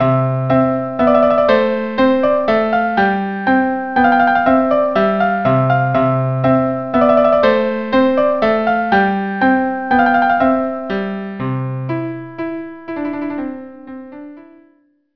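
Clarke tpt minuet vn va, trumpet minuet violin viola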